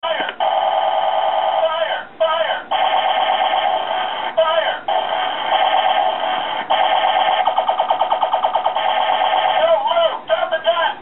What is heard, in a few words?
Fire